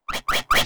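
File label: feather attack; deltarune yellow translation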